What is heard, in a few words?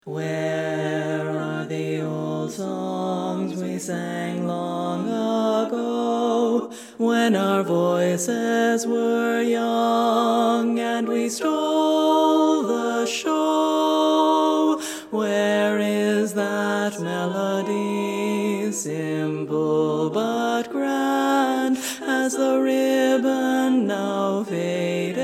Old Song Bass